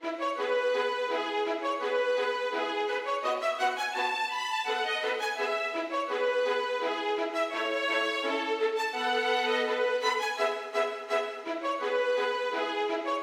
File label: Polka; Ursin